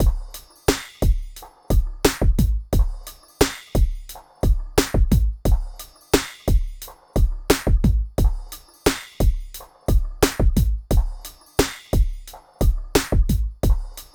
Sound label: Beat